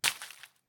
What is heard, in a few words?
Shell 39mm leaves; STALKER; IWP